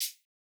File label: Electro hihat; Reference Beat